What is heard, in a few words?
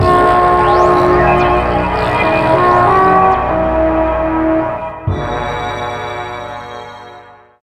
Level 1 (Jingle); asterix obelix xxl ps2